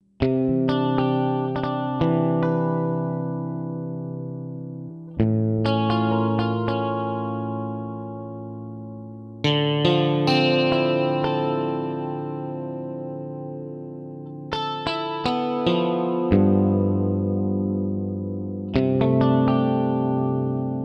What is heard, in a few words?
Charlie Lab Digitar